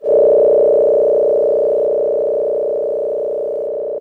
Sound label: FX DX100 D4